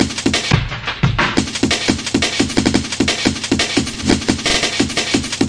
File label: 175 high drum n bass journey; 161 to 180 bpm